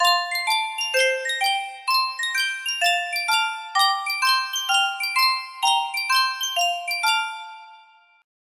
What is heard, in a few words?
Sankyo Miniature Music Box, music box melody